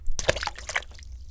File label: ball in water; GTFore